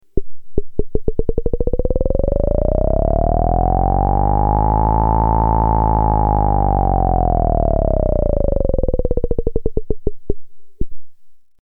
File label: VCF Mod